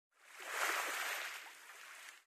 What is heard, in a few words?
swim; out of reach treasure royale 2020 gamerip pc